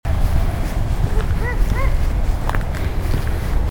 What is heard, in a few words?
low call